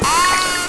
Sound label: squeak, orca